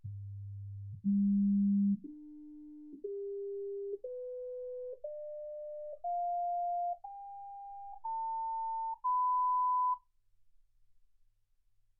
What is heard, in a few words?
sinus tones 48kHz